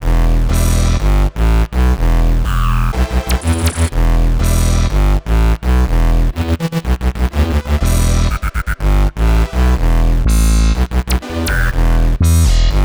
Complex Electro Synths, Em, Full Mix; Onyx; Prime Loops

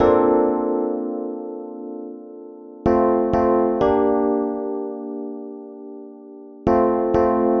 126 G D MUSIC; funky; Loopmasters; Funky Underground Vol 2

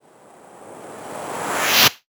FX Uplifter

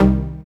TECHNO G2; Jack Sparrow Deep Dubstep Progressions

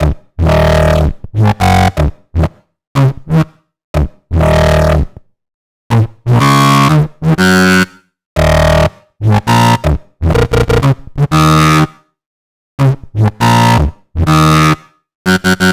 122 D#m Bass, Complex Electro Synths; Prime Loops; Invader

